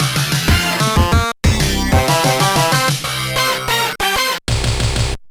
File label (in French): jingle victoire; Beach Volley